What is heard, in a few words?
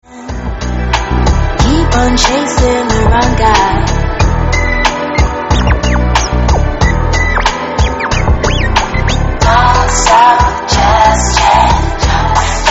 scratch mix